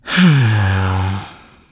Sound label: SIGH